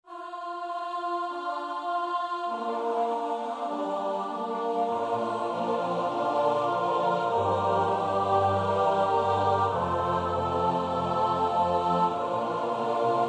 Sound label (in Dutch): S-A-T-B, koorpartij oefening; Tomas Luis de Victoria; Officium defunctorum a 6